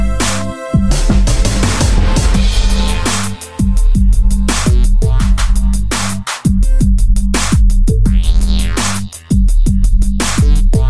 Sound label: Winter Beat